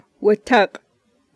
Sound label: fart